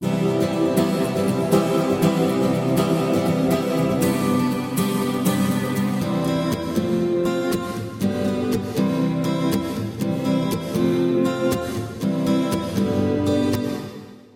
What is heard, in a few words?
Reverb